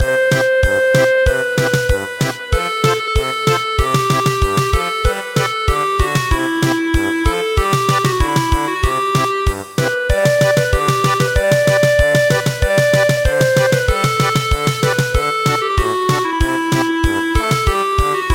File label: German Polka, traditional